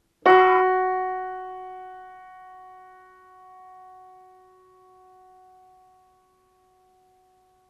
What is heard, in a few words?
piano F sharp